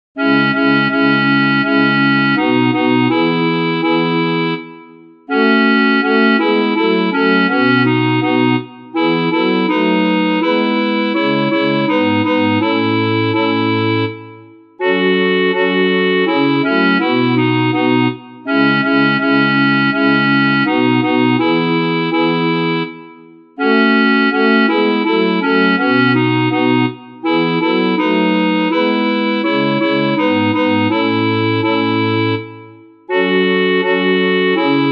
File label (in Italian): CONTRALTI; Veglia di preghiera - In adorazione di Gesù Bambino 2022